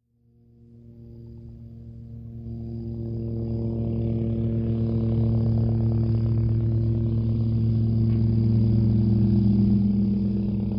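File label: Loach In Land Medium, little bird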